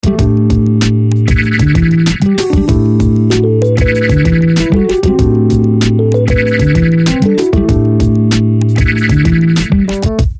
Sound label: groove